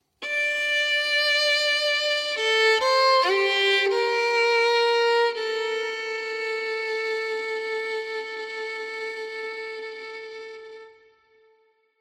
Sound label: Violin Documentary Drama